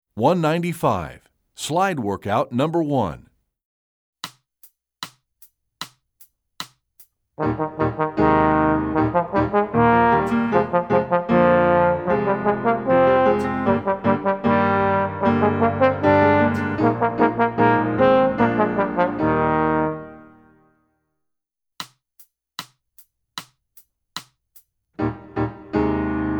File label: Slide Work-Out, trombone; essential elements